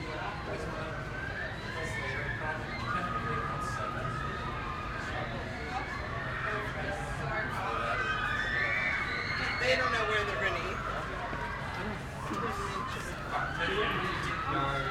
BUSY STREET walkway, amusement park, near the sea, distant children screaming, people walk; Navy Pier Chicago USA; Busy Streets Ambience; people walking by